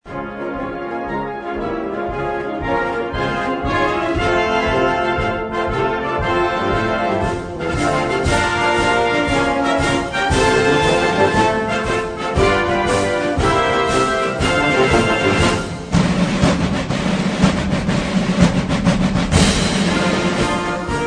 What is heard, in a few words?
us army march